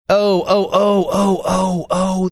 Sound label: oh oh oh oh oh oh